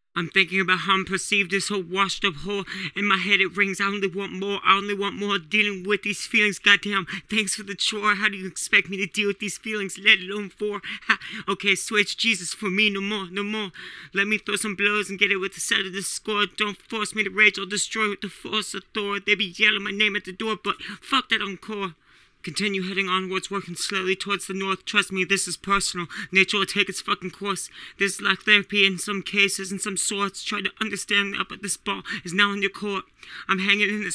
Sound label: vocals